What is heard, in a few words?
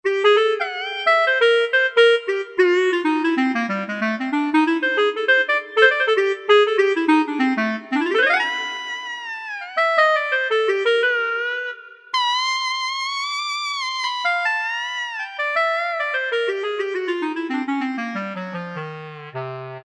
Clarinet, Yamaha PSR-3000; Factory Voices; Flute & Woodwind